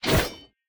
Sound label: Attack Light; bloom minigame